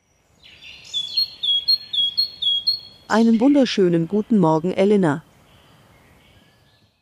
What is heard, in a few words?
Birds